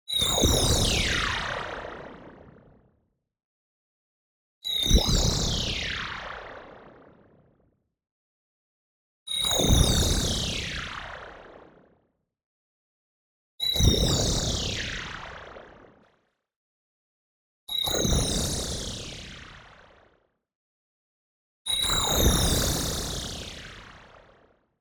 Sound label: BLING High Glassy Shing Noisy; boom library; Anime Essentials